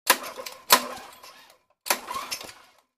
Lawn Mower: Cord Pull, Motor False Start.

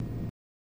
Faucet Squeak Turni, water

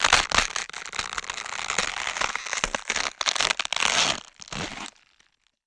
Cabbage Rip Shred; gore stabs & eat

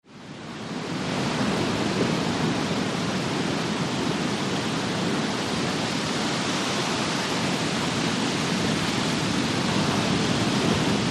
Heavy Rumbling Surf, waves & surf

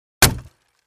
Crate Palette Type 2 Hit with Sledge Hammer

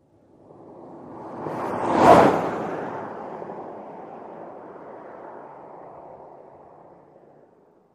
Sm Car By RL 100mph, compact

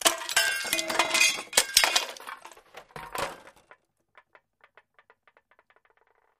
Junk Debris Spill

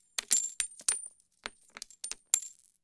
Coins Falling Coins D

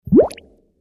Bubble Pop Rising Wet; bubbles

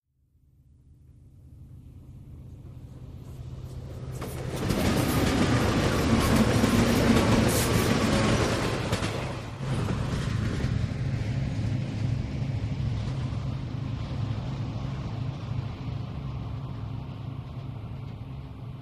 Train Engine By Clos, diesel

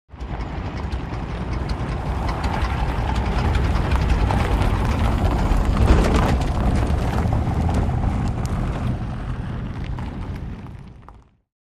Taylorcraft Taxi By

